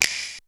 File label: SNIP; drum; CLAPS+SNIPS